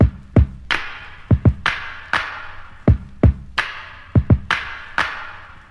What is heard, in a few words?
clap to this, funky drum loops